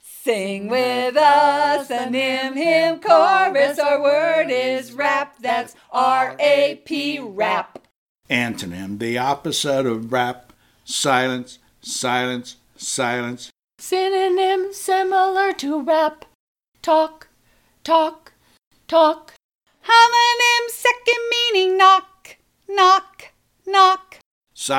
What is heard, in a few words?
Chorus Rap